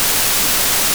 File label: WHITE NOISE; ELECTRIC-SET